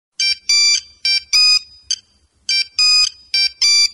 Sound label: Msg Tone